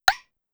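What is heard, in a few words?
Conga Slap Up Fast, Drum Machines Clavia DDrum-AT Pitch Envelope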